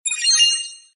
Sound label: success